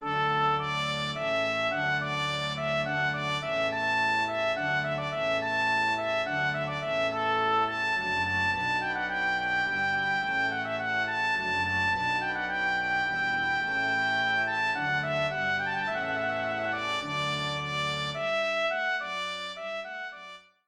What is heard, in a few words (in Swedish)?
Hornpipe visa